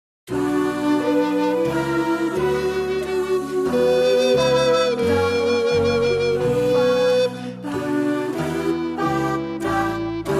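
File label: trio grave flute; loeillet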